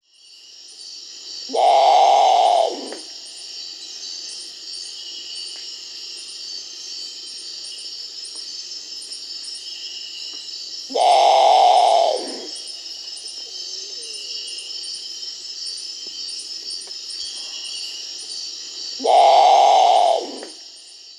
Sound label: Great Potoo (Nyctibius grandis), Peru; Birds of the Amazon; S. America